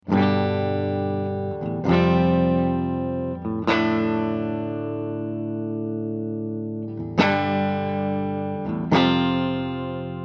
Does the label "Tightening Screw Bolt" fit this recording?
No